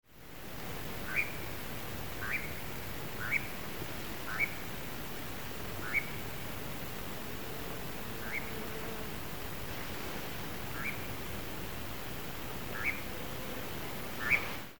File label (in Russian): птица